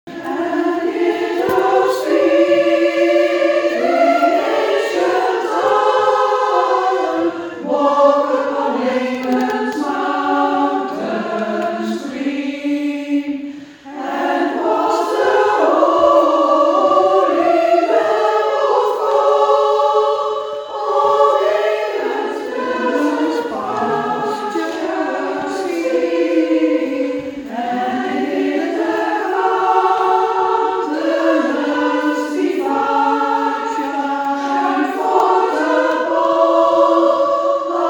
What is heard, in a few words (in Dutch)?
Jerusalem Sopraan Alt